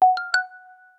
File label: messaging app notificatio